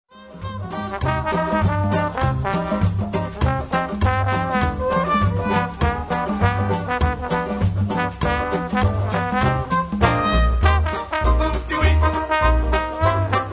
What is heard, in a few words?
the monkey song instrumental